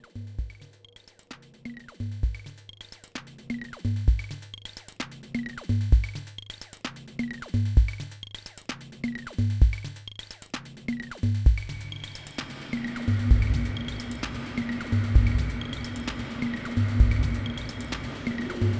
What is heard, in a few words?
Drum Machine; Specular Tempus Voices